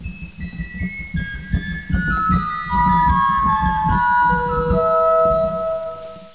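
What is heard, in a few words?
Terz, organ; Martin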